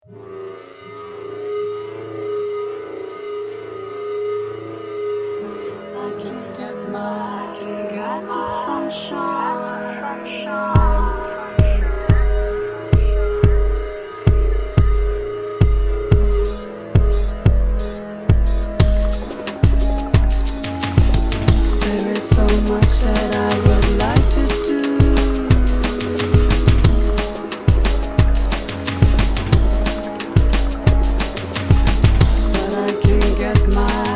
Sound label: Dial